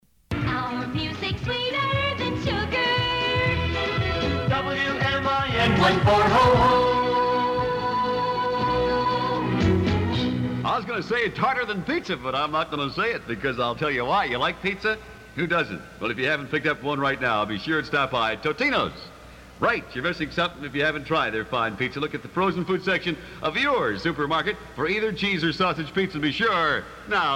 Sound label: Country, Early 70s; WMIN 1400